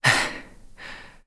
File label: Recover Lowest; recovering from lowest level of stamina; Link; ("Lower" #1 is also used); Skyward Sword; zelda